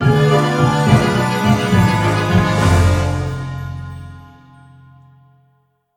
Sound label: Death Jingle; Spirit Roots